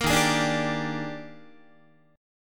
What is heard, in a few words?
Eb Major 11th, Guitar; EbM11; strummed; JGuitar